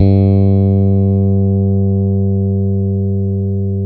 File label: BRITE FRETLS G 3, Bass; Spectrasonics; Bass Legends CD 1